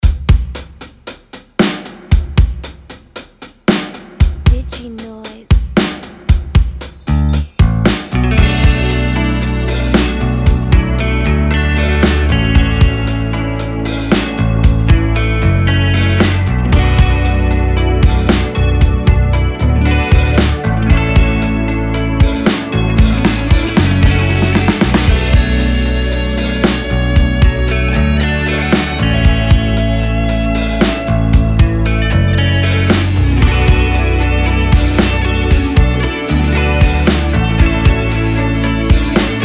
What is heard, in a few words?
Magic Pop Drums; Diginoiz